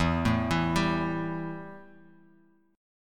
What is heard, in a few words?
E Minor Double Flat 5th; chord shape